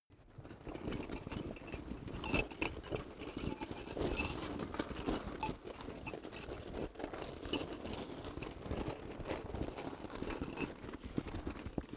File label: metal backpack parts rattle